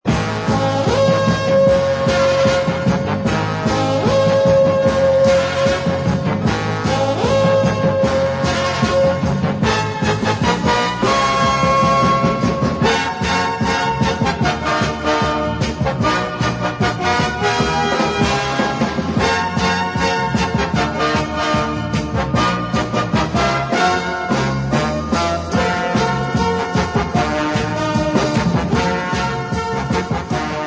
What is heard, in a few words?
alarm; ring tones; mobile phone